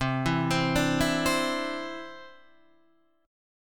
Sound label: C Major Add 9th, Guitar DADGAD